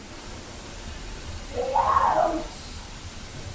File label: 2nd floor Scream; SCREAM FROM UNKNOWN AREA; VOICE ISOLATED